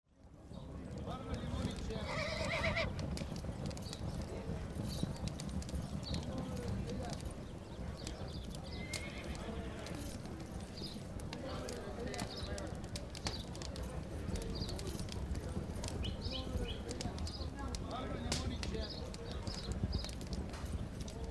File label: Camp Mix; Age of Empires III; cinematics